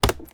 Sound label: mining wood; Factorio